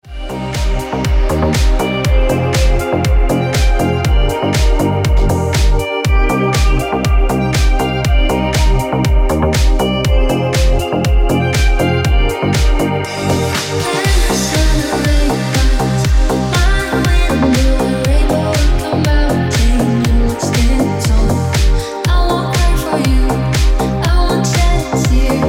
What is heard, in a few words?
Ringtone